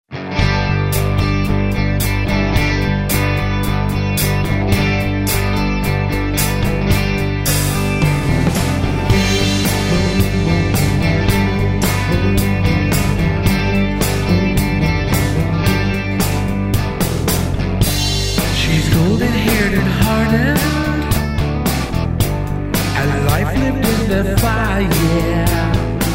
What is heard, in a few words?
RETRO ROCK